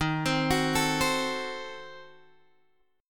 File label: Eb Diminished 7th Eb, Guitar Open G